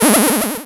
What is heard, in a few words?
Cry